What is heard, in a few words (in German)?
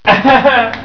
LACH